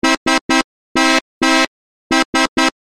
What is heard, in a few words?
Nokia Horn Message